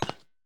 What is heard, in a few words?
Footstep Wires